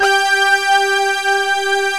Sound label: PERC 5ths